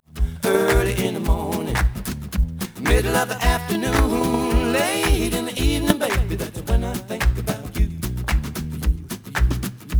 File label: noised; 3bit